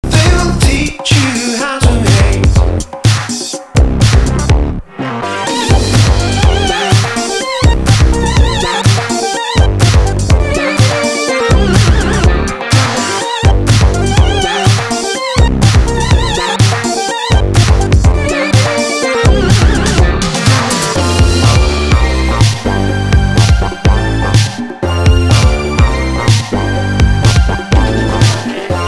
keyboards amp synths

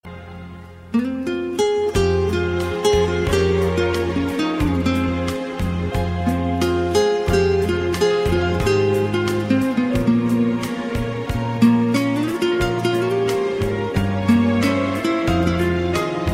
acoustic; godfather

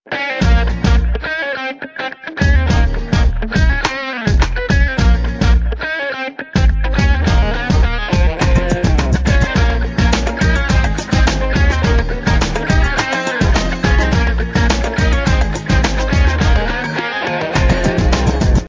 ringtone elektrogitara

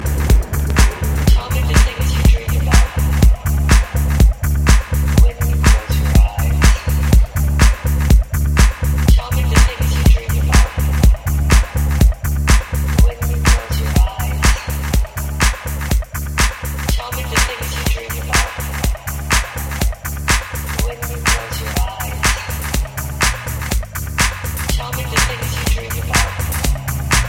minimal

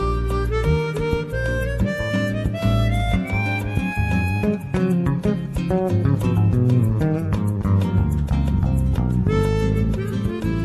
bossa nova latin jazz

